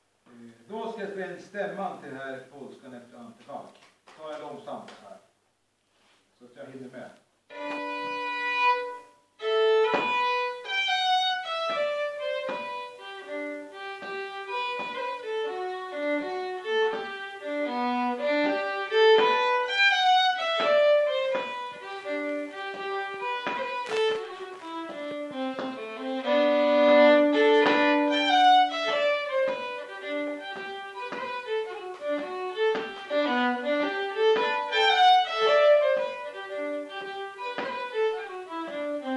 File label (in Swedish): Polska Stämma